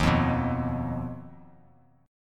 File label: D sharp Suspended 2nd Sharp 5th D sharp; strummed; JGuitar